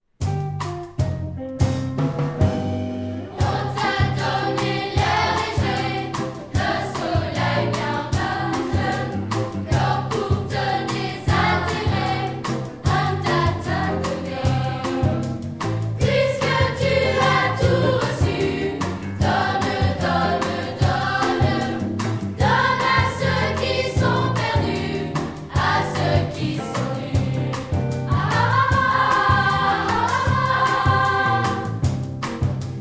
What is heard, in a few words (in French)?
polyphonie